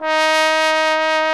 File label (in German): POSAUNE BASS ES; Best Service; Alpine Volksmusik